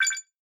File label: pickup